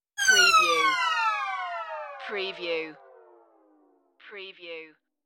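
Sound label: High Dive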